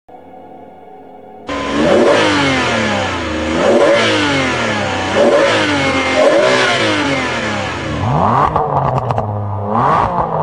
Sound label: 612 Scaglietti, ferrari